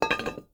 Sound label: ui drop metal hollow; Rust